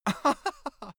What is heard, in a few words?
male laugh bright